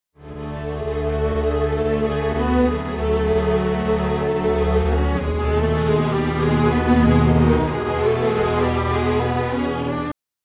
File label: strings; timestretch